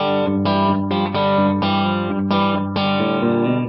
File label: twangy rock or country guitar; GUITAR LOOPS